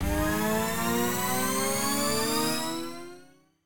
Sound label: respin Snd, slots; king of slots